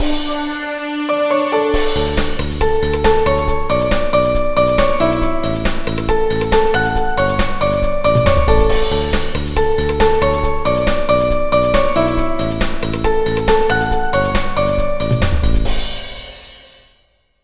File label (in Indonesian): Nada Dering Nokia Jadul; Destiny